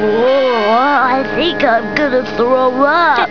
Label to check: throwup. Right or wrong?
Right